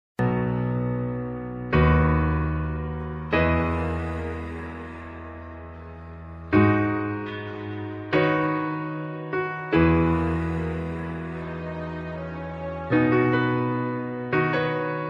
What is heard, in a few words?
romantic ringtone; valentines day ringtone